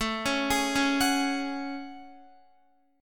A Major 13th, down Guitar; AM13 arpeggiated

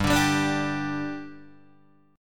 G 5th G Guitar DADGAD, chord

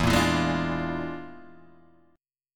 E 7th Flat 5th Flat 9th; JGuitar

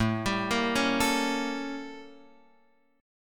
A 7th Flat 9th Guitar Open F; A7b9 arpeggiated; JGuitar

